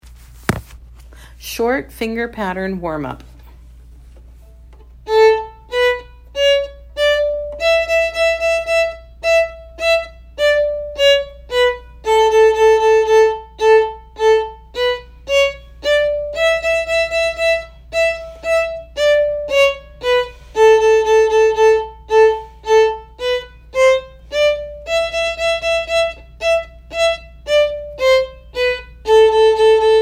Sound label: Short finger pattern warm up